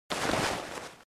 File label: large camera sound